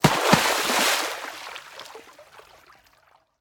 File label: splash; Scrat